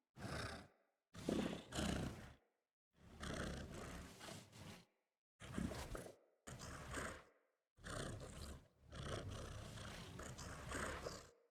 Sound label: Wake Up Tent Loop Hare Snoring